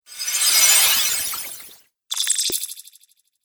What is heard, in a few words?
Magic Spells; Magic Sound Effects